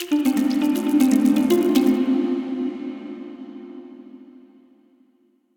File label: Glitters and Sprinkles, Lost Keys; Instruments; Ableton; Factory Packs; Lost and Found